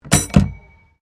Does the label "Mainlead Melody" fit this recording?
No